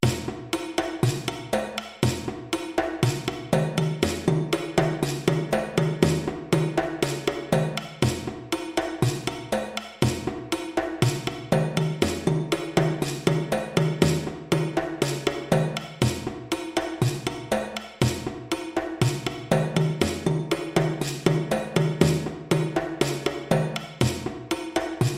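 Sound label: groove 120 bpm, djembe; Kuku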